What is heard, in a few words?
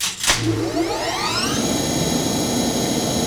SERVO STA, Cyber Boog Servo; E-MU Producer Series Vol. 3 – Hollywood Sound Effects; Science Fiction